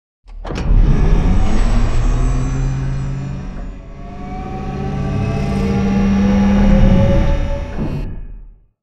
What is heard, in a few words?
Giant Floor Panel Exit On Track Servo; Boomtown; Sideways Town; Titanfall 2